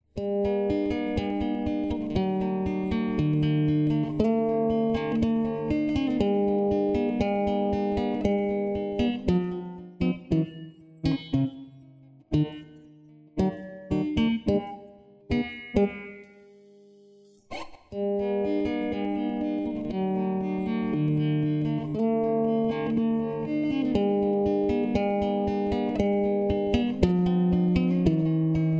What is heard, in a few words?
Acoustic Guitar Plug Change; AtomicTransient